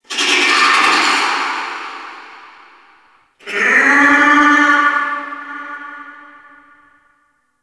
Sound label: Ice Critter Distant F, Ambience; Warframe; Corpus Ice Planet